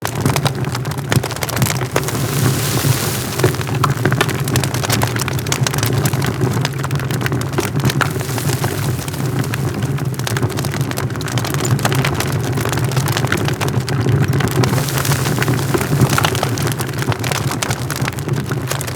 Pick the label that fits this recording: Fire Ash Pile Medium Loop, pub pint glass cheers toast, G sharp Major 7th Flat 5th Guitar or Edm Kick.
Fire Ash Pile Medium Loop